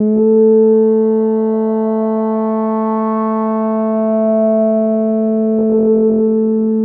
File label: SNTH BASS TEKNO 140 A